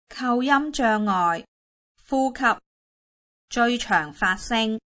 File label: exercise breathing; aphasia